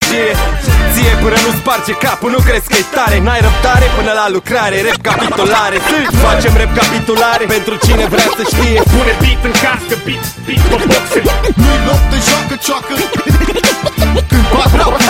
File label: rap